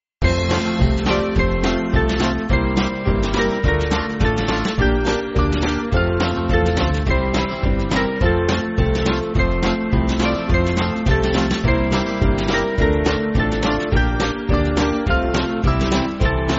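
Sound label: Band, Music Backing Files for Churches; God In Heaven; Harrison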